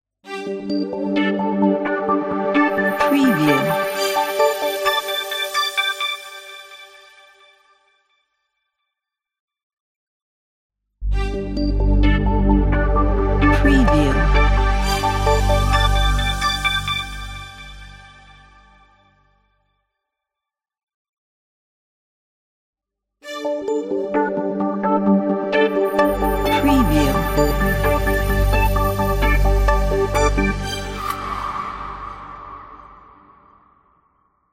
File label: smart logo